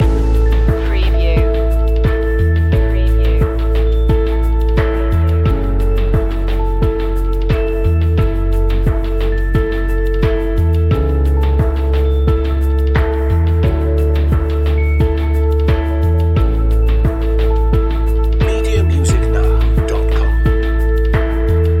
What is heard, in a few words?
in a warm place loop